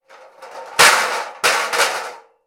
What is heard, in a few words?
impact metal ladder fall; sound effects one